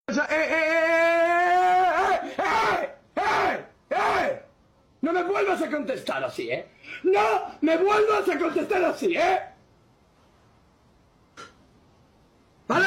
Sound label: ehhh ehhhhh